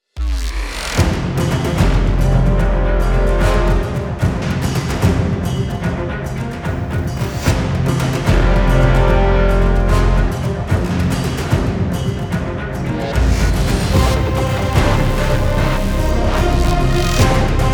Aircraft Carrier Combat

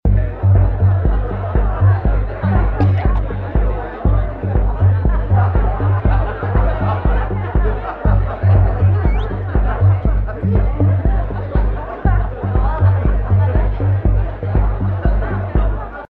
Amb Houseparty; City

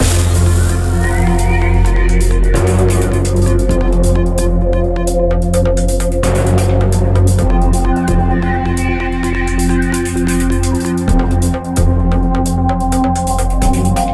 Tense; Everspace 2

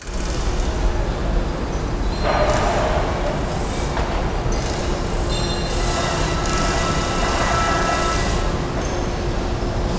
Ambience Exterior Bodyshop

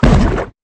Fizz Bomb

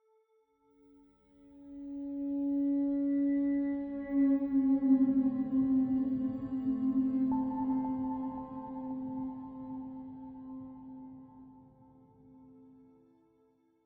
85bpm; Legends of Pegasus; windows gamerip 2012

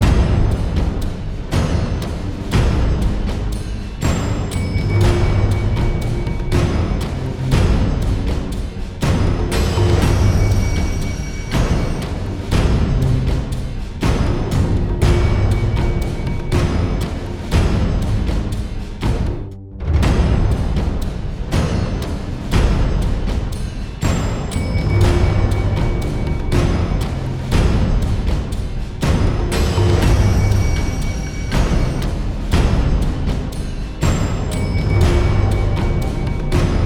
Combat Percussive

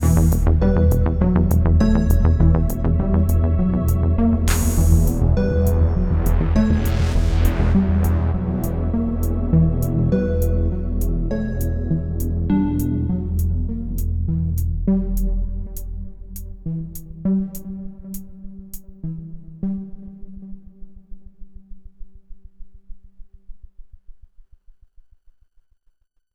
Key Item; Plants vs. Zombies Battle for Neighborville; Dreadwood

